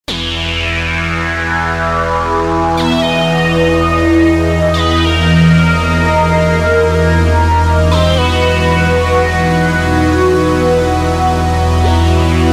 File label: the machine